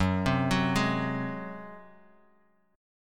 Gb Suspended 2nd Flat 5th, Guitar, Open D; 4,3,4,2,x,x; down; chord shape

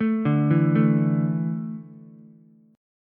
E 7th Suspended 4th, Ukulele; E7sus4 arpeggiated; JGuitar

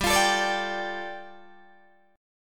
G 7th Suspended 2nd G, Guitar Open D; down

